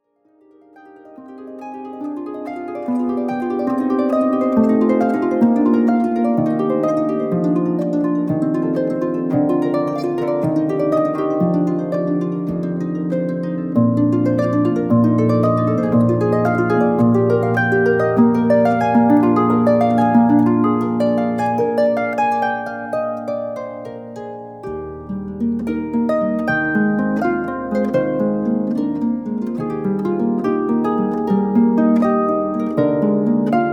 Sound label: Nocturne for Harp; Klanghaus Eibelstadt